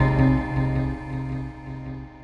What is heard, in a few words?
FX, Futurist Drum & Bass